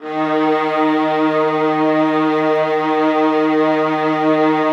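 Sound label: violas sustain d#3; Sonatina Symphonic Orchestra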